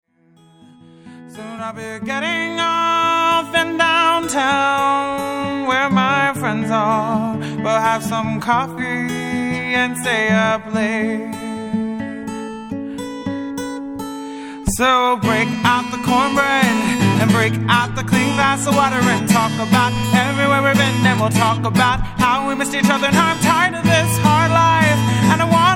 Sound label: Acoustic